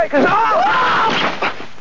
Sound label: aarrrghhh, horror sample